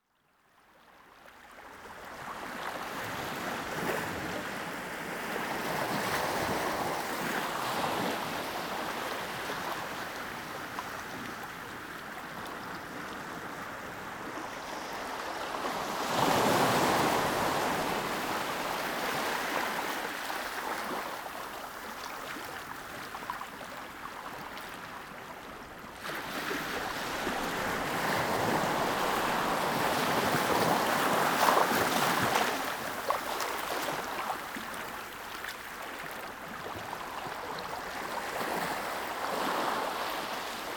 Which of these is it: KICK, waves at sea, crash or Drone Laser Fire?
waves at sea